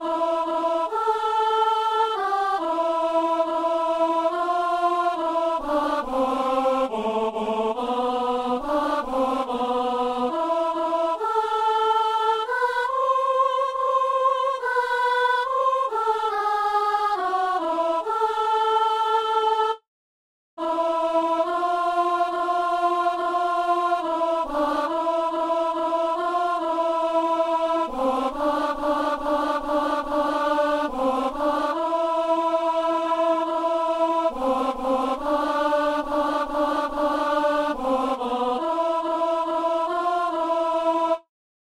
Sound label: soprano; Messe du 17 janvier à Saint-Denis; Maintenant, Seigneur